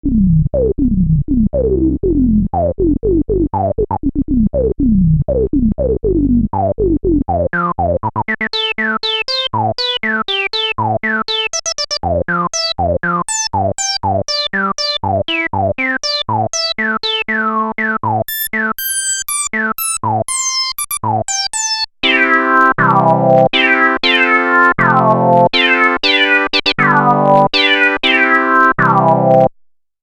novation nova desktop filter lpf + lpf par q100 n050